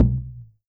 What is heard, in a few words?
CHANG GO, KOREAN DRUMS; AKAI S6000 CD-ROM; WorldSounds